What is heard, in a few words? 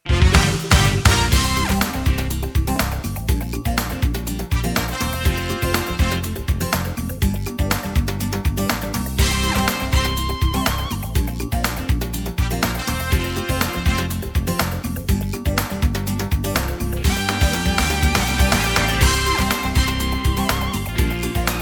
instrumental; Coughlin; Logos Radio TV; Jingles; 30 sec jingles